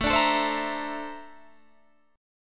A sharp Major Sharp 11th, A

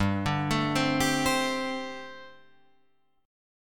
G Suspended 2nd Suspended 4th G, Guitar, Open F; arpeggiated; 7,9,9,7,10,7

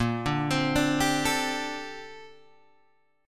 A sharp Major 13th, Guitar Open G

